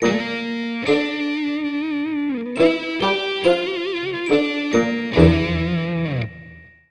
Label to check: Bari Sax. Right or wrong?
Right